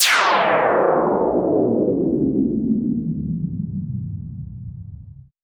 Sweeps Down

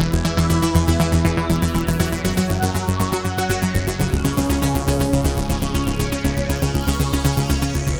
Arp, Keyboards

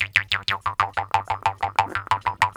KHOMUS

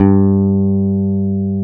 FEND P-BASS G2; Sonic Reality - Vintage Timetraveler

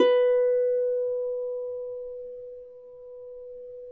B4 HARP; Complete Harps; USB Soundscan vol.62; AKAI

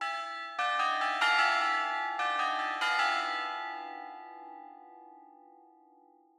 Tube Bells 75bpm E; horrorscape

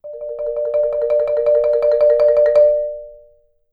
Marimba roll D4; Sampleheads - Dave Samuels Marimba & Vibes

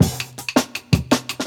10 Pin Beat; 90s Sample CDs; Original Jungle Breaks

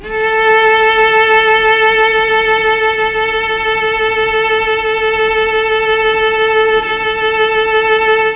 A3 CELLO, Mellotron; Mike Pinder - Mellotron Archive CD

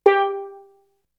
Melody oneshot; ZERO G - Datafile DISC 3

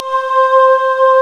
SOFT B C4; D50 STORY; East West; Global Sounds

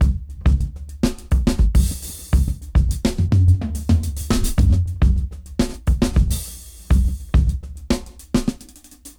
Jazz Dry 104 BPM; AMG - Keith LeBlanc Phat Butt Jams

